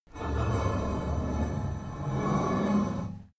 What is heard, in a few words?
metal heavy scrape